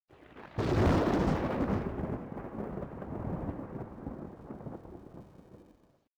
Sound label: thunderstrike; Soldier Of Fortune II Double Helix; XBOX; ambience